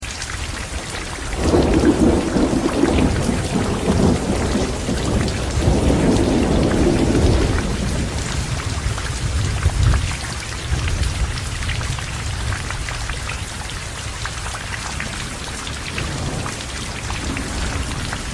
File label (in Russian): shum dozhdya i groma